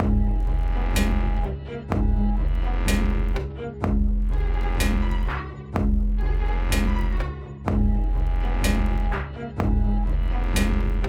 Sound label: Underground Loop 125bpm